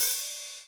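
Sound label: HR16 open hat; Crypton - DrumBase5000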